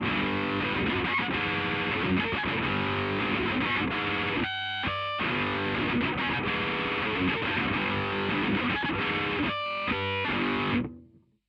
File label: inharmonic e c